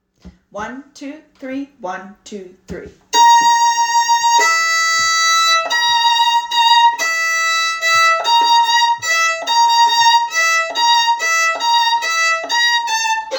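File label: macabre violin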